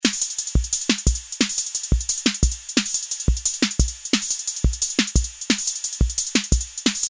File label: Beat Normal